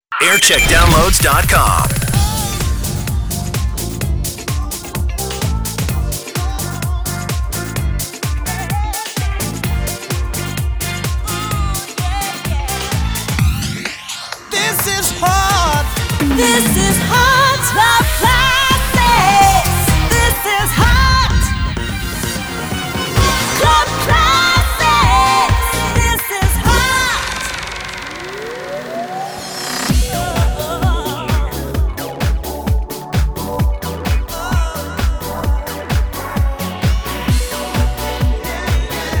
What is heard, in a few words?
jingle montage; heart